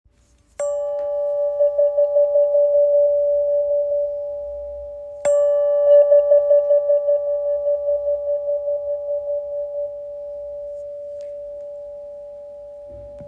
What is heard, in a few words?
Wahwah